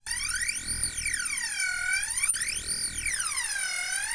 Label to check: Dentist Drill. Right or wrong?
Right